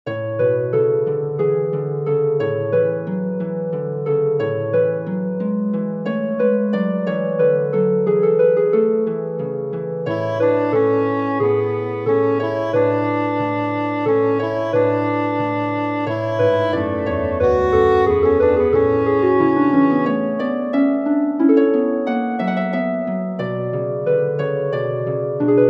aria for english horn harp; bach johann sebastian; ach schmeckt doch gar gut

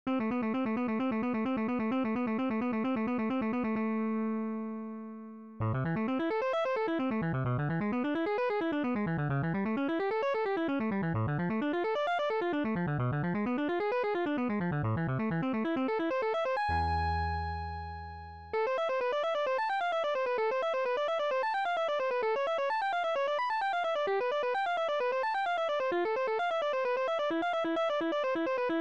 guitar solo; paganini niccolo

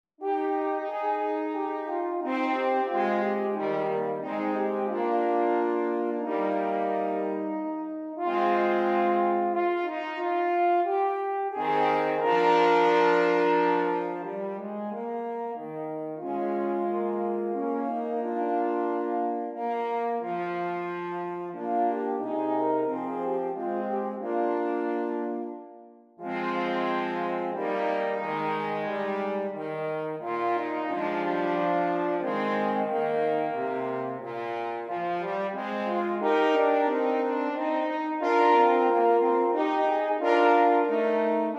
horn trio

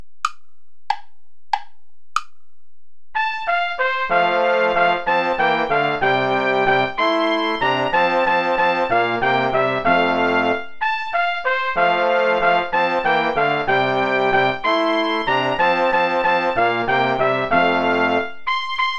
traditional play along without trumpet; asikhatali

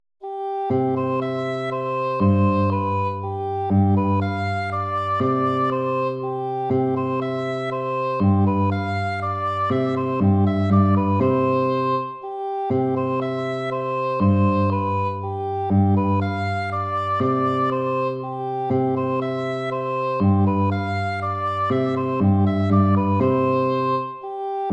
waltz; Carulli Ferdinando

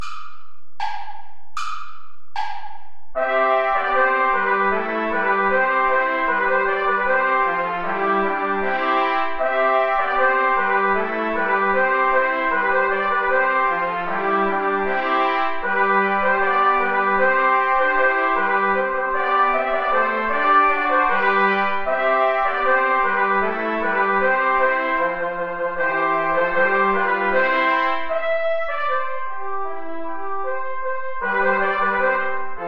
play along without trumpet; deck the halls; traditional

